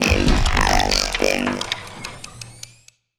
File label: lithoid machine; lithoid portrait; Stellaris